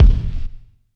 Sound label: Crime Kick